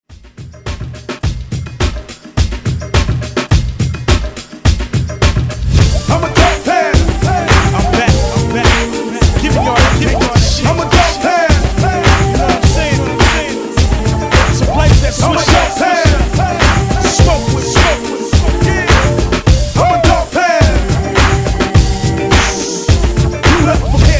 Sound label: 90s ReDrum 105 Bpm; DJDOLLS